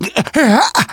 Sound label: choke, survivor voice